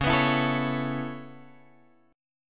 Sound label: C sharp Minor 7th Sharp 5th C sharp Guitar Drop D